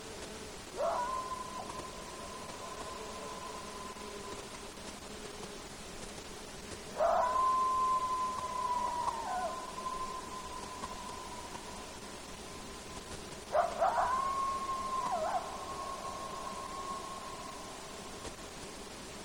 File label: Coyote Howls Close to Microphone; AMPLIFY +15dB; Alaska; MAMMALS